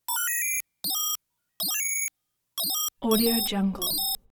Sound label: tone clusters mostly high pitch tones